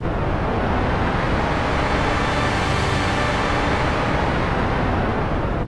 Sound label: Windows NT Pre-Alpha Startup; Windows Never Released Soundpack 2